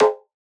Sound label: Vintage Percussion Two Tone; Cymatics; Mystery Pack Vol 4